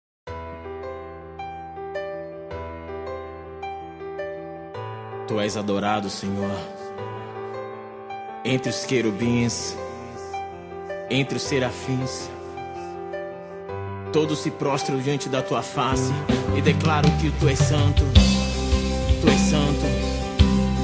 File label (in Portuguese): aleluia